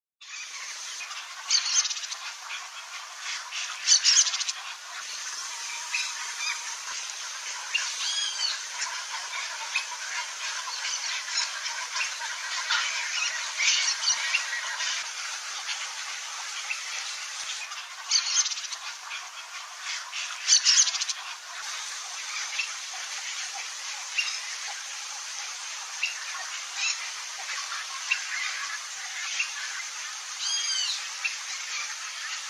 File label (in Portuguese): canto da garca vaqueira